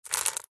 foley gemstones several small opal amethyst jade pick up from surface